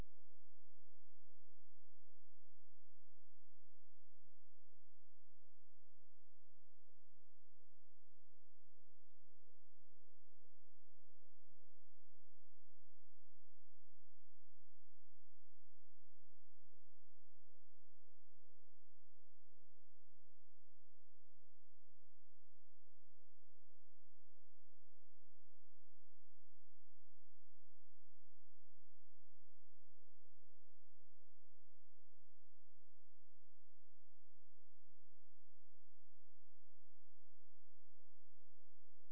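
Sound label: hydrophone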